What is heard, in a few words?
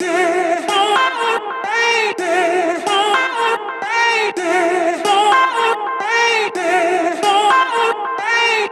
Vocal Loop 110Bpm, House; Ghosthack Advent Calendar; House Kit; House Construction Kit